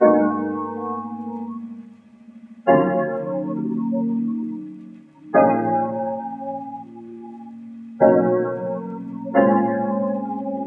R&B Melody Loop - 90 BPM D# Min Piano; Melodies; Loop Stems; Cymatics; Oracle Sample Pack

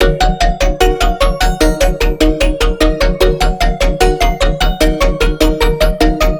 Melodic Bass Loop D# 150; Loops; Knocking; Ghosthack - Color Bass Essentials

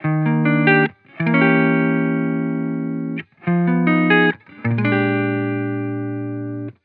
Chill Guitar Loop - 140 BPM D# Maj; Cymatics; Vibrations Guitar Sample Pack

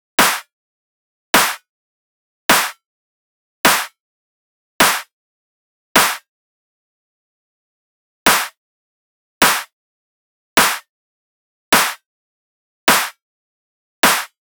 Drum Loop - 104 BPM Clap; Midtempo; Blossom; Cymatics; ANALOG EVOLUTION Beta

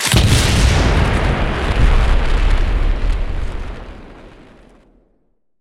explo mine; Explosions; PremiumBeat

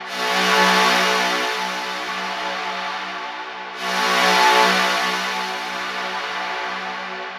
Pad Loop 130 B, Techno; Loops; Pads; GhostHack; Ghosthack - Free Techno Sounds 2024

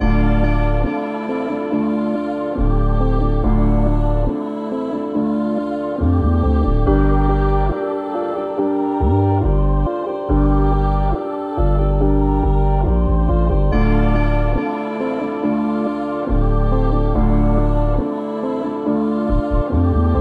140 BPM D Min, Drill; Melody; Cymatics; 2022 Melody Collection

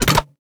Attack Crossbow; Game Essentials

